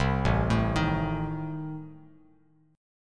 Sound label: A Diminished A down Bass Standard, chord; Adim arpeggiated; JGuitar